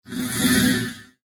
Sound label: Corrugated Laser Ripple; Laser Sound; science fiction sound effect